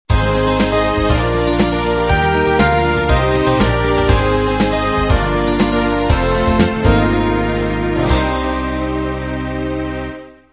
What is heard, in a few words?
soft beat end